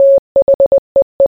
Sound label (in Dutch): morse thee